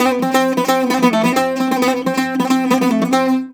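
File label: bouzouki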